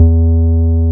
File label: BAS MKS-50; Best Service Gigapack 2